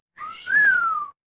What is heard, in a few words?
cartoon slide whistle